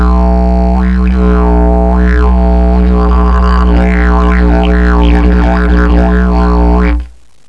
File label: didge; australien